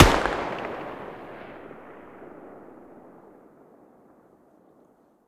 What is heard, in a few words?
mg muzzle mono far, weapons stationar